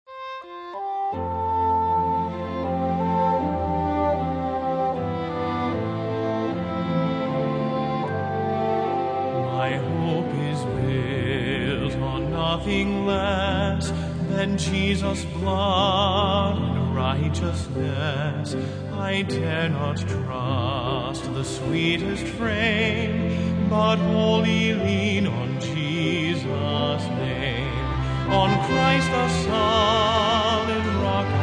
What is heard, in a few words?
Singers And Orchestra